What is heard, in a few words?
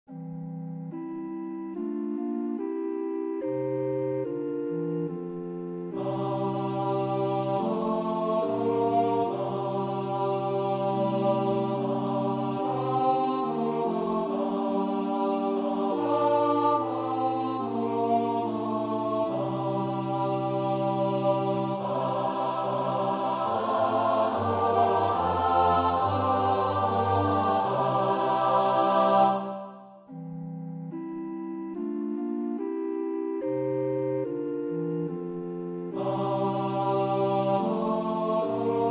S-A-T-B; Darke (Harold E); Communion Service in F; Agnus Dei